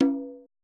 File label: perc, Roland MC-909